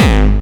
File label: Bassdrums Hard Kicks; Vengeance Samples